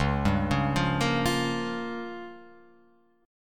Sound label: Db 6th Flat 5th Db down Guitar Open F; Db6b5 arpeggiated; JGuitar